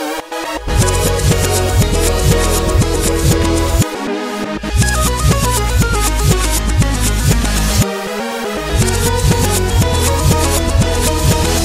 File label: rage music